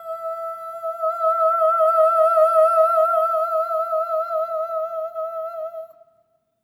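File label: Soprano Oh E5, Voices; Spectrasonics; Symphony of Voices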